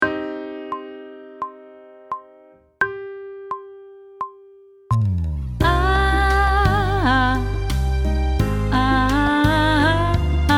Sight Singing with vocal, pop vocals; Grade 4

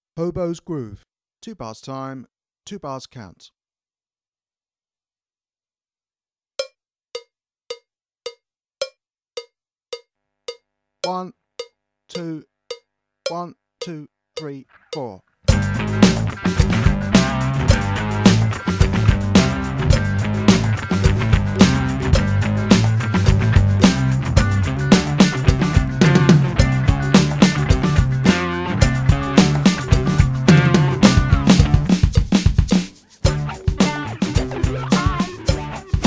Groove Backing, drum kit; Hobos

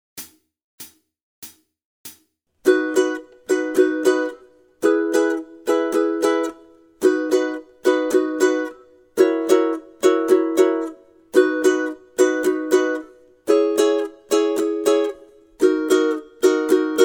rhythm study, ukulele; grade4

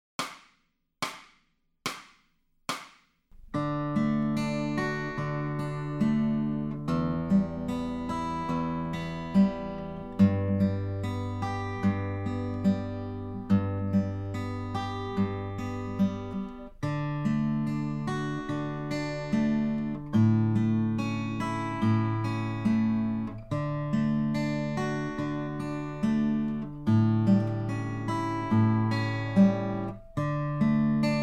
Fingerstyle, acoustic guitar; Grade 1

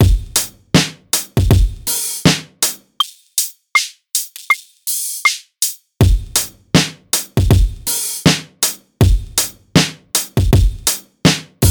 DJ Scratch Beat; DJ Debut

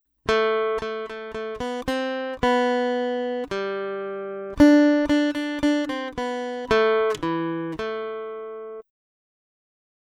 Aural Rhythm Test, acoustic guitar; Grade 1

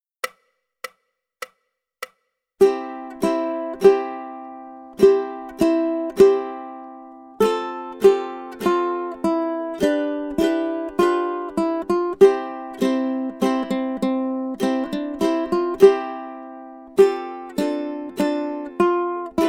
This Old Man, ukulele

